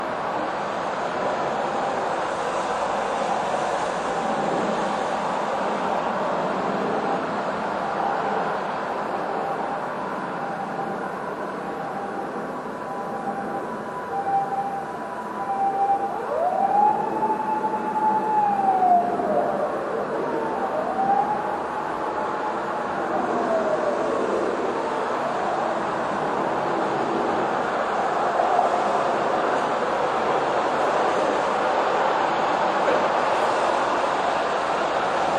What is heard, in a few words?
toilet siren